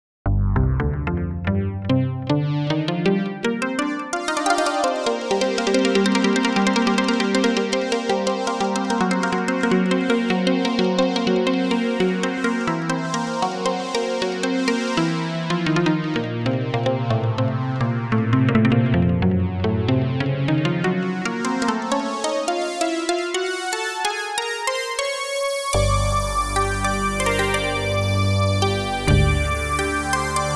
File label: keys lead solo